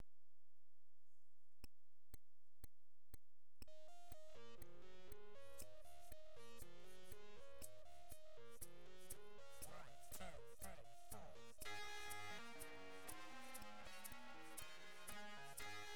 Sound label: shaker Recording